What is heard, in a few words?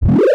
bip